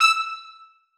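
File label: trumpets staccato e3; Sonatina Symphonic Orchestra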